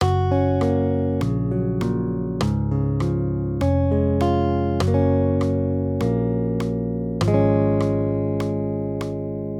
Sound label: maj triads; accompaniment